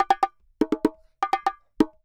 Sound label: Bongo Poly 120, Drums & Percussion; Best Service; Festa Latina VOL-1